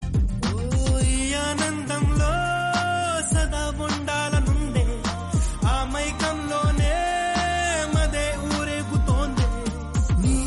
telugu song; Orange movie

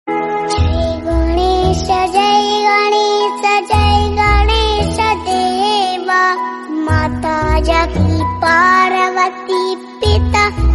ganesh aarti; jai ganesh deva

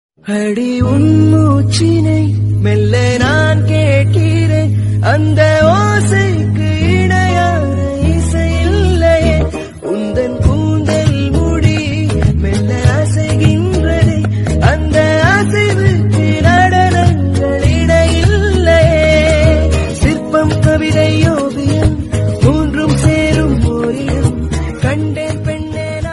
tamil romantic

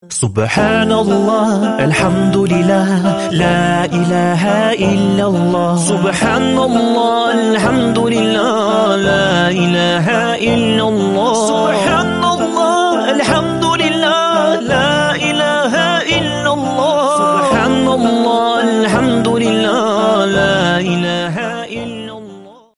islamic

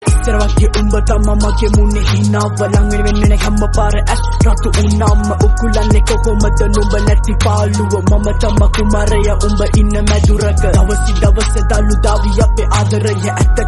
rap song sinhala song

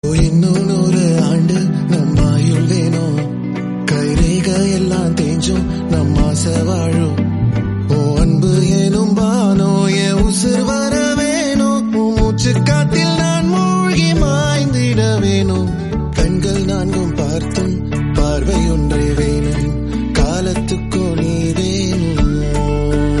tamil song; vendhu thanindhathu kaadu

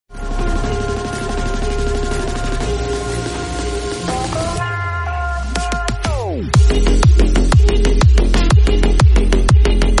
bgm theme; squid game

